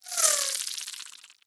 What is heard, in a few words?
Inf Uzi Proj By; Infested; Weapons